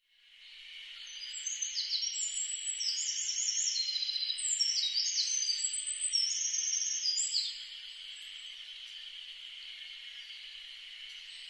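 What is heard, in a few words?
Eurasian wren; misosazai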